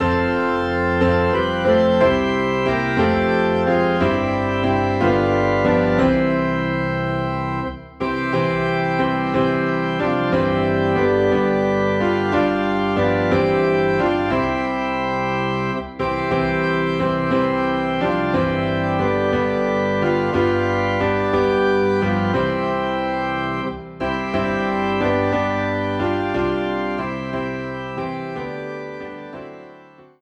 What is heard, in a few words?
Piano and Organ Low in C